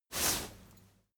crowd movement single bright; bewegung; soundpool

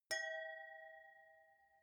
wine glass different clink very soft; berufe; gastronomie; soundpool

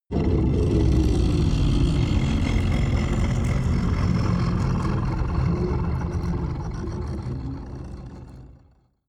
crumble soundscape high; drones

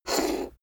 poker chip flick slide table long; chips

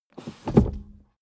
suv release reverse gear bounce; verkehr transport; autos

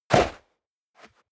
step leather shoes sand jump

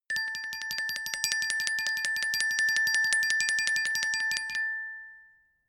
glockenspiel tremolo low octave

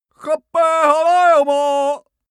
male shout arabian

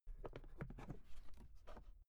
briefcase open; industrie wirtschaft; bueroarbeit

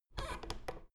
door plastic handle pull down creak soft

